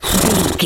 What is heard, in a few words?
horse breath